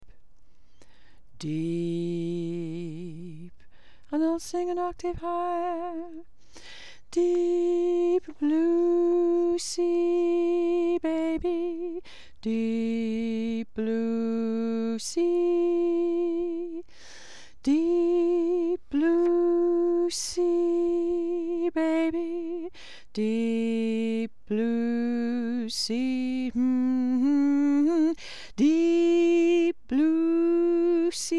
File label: bass